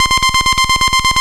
Sawtooth Trem C5; Ableton Foundation FreePacks; Foundation Glitch Toys & LoFi

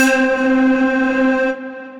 Wrectapluck, Synth Keys

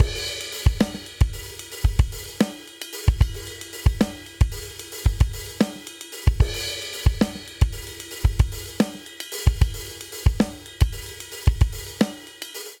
Triplet Hip Hop Outro 75 bpm; 4 4; MIDI Clips; Ableton; Factory Packs; Drum Booth

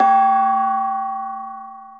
Gong A; Latin Percussion; Drums; Drum Hits; Cymbal; Ableton; Factory Packs

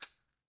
Shaker

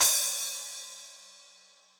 Splash 12 Studio Basic, Cymbal; Drum Hits; Standard Hits; Ableton; Factory Packs; Session Drums Studio

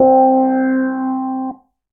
Suitcase, Suitcase Piano; Suitcase FlowTron Model A; Ableton; Factory Packs; Electric Keyboards

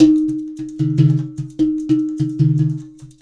147 African Ogenne; World; African Percussion

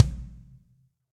Kick Plastic Studio Basic; Drums; Drum Hits; Standard Hits; Ableton; Session Drums Studio

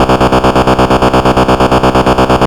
Pitfall Trem B0; Ableton Foundation FreePacks; Foundation Glitch Toys & LoFi

